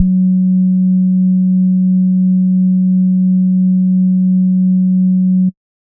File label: sub bass sine F#2; neuro; Stanza